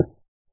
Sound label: stone, dig; Minecraft